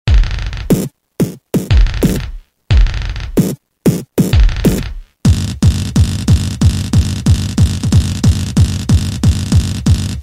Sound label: Kik x4; Roland M-DC1